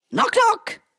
idle knock knock; goldentavern

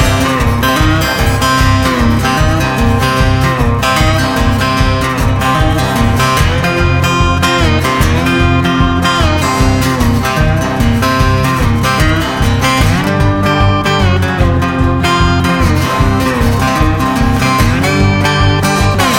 big Win Music, game; dead or alive 2

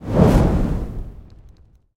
Deep Flame; alohaxmas

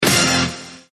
double Up Lose Sound; jackpot 6000

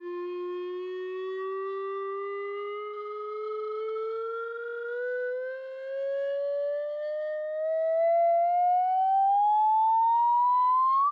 Reel Near Win Snd; flowers

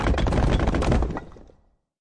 first block; pyramid

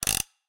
reel Bounce; dead or alive 2

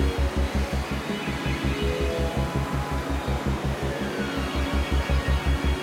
freespin Outro Loop; hotline2

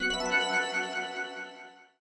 gem Turned On; elements

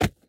spin button; irish pot luck

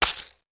Hit Light Forest; wild worlds

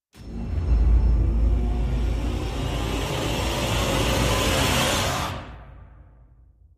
near Win Riser; warlords

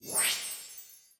Text Animation In; aloha

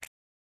button Out; hotline

